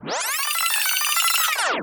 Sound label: Rewind Short